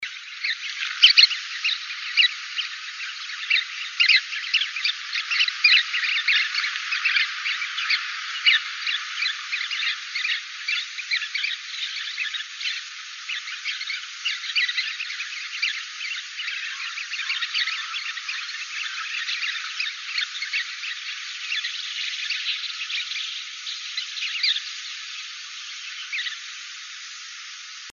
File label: CCLO CALLS, Emberizids; deviche